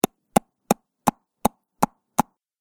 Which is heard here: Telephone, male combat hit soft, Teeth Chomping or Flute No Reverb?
Teeth Chomping